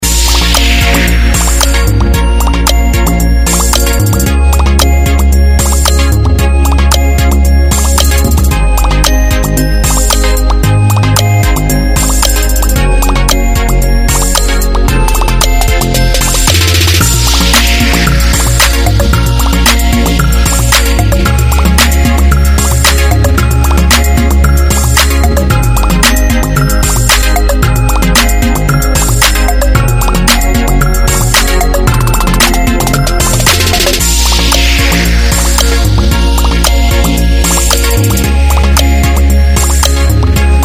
instrumental

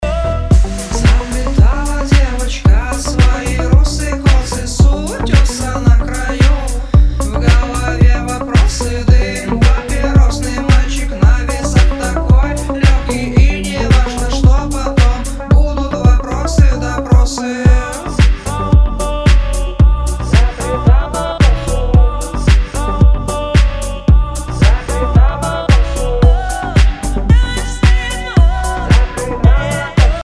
disco

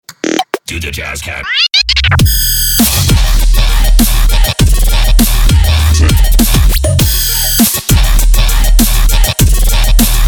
jazz cat funk remix

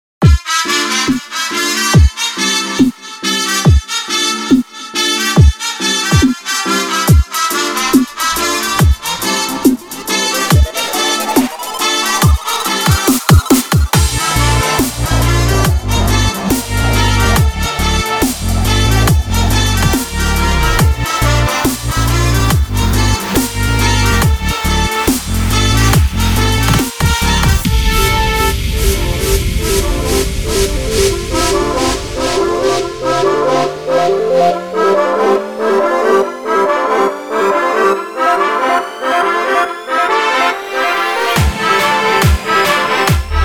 dubstep

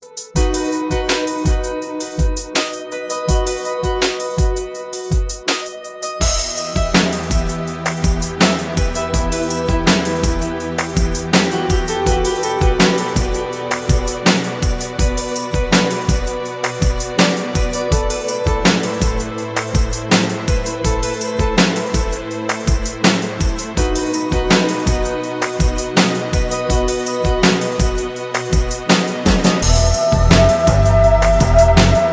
beautiful melody

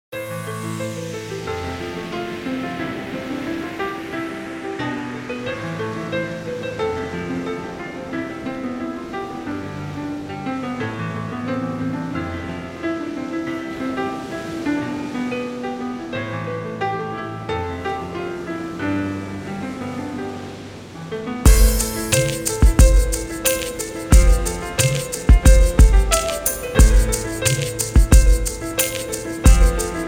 chill

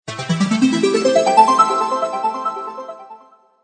space tones sony ericsson

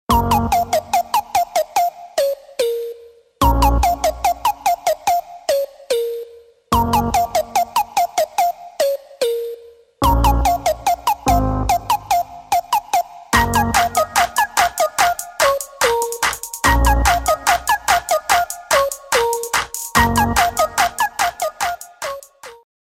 clear bass

